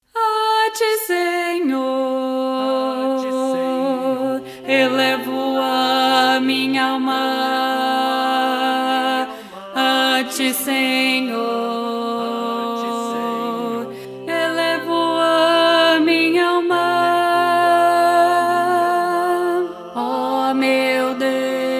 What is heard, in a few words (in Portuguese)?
a ti senhor contralto